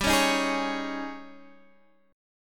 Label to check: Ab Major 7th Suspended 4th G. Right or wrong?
Right